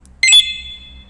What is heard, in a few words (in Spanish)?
Activacion, ringtones de alarmas